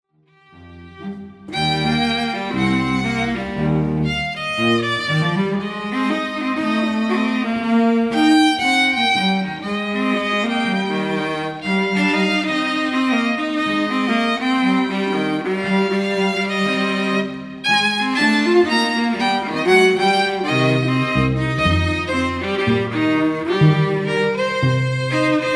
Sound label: String Trio; Kent